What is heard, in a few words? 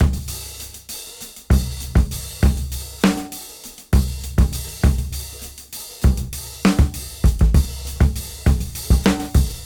KL Beat 98 BPM; AMG - Keith LeBlanc Phat Butt Jams